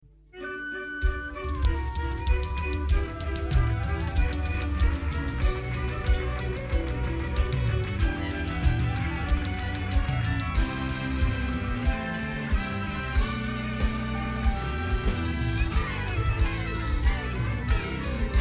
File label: offering instrumental; healing streams 13